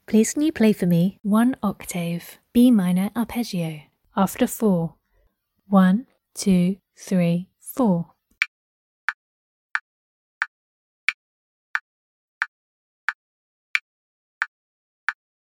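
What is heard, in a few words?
organ, B minor arpeggio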